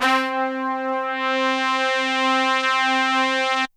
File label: HORNS C3